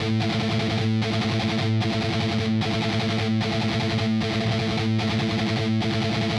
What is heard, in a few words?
loop A 150bpm